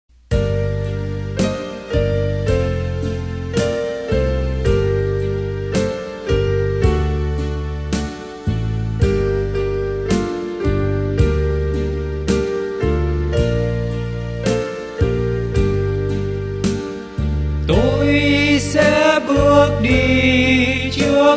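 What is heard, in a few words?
soprano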